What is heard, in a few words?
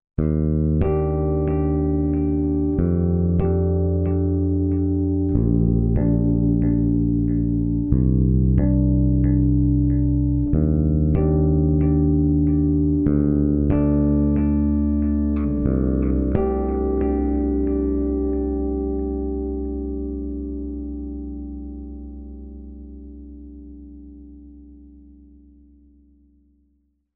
Delay